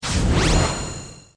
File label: intensify